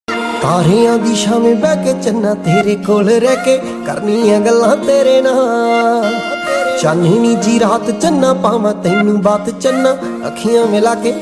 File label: Punjabi Song Ringtone